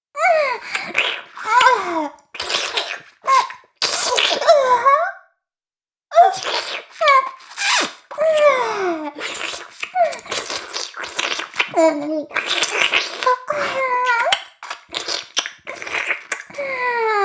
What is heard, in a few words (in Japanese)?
フェラ音声; おにいちゃんアイスぅー！; 妹